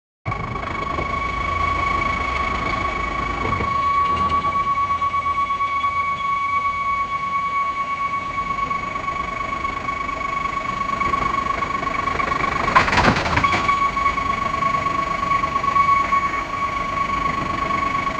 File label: a truck sound slowing dow, braking